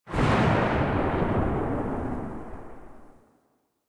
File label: Archgun Tail; Warframe; Archwing; Weapons